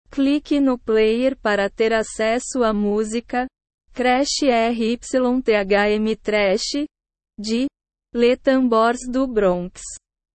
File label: crash rythm trash